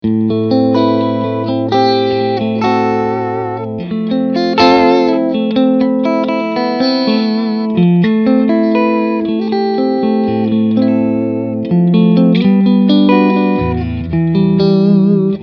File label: Clean Chords II